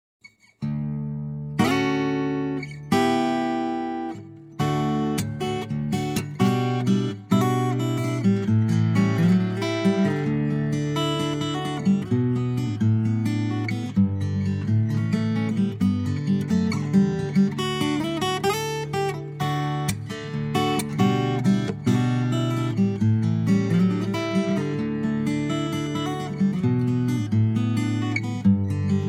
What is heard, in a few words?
Guitar